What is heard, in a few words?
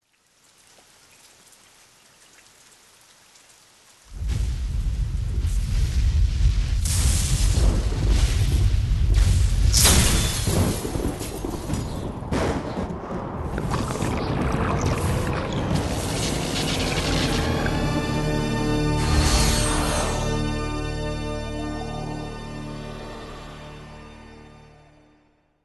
thx thunder